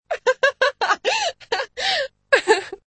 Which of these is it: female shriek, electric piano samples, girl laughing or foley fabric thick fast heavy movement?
girl laughing